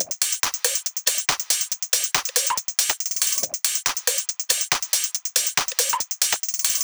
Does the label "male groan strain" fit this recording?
No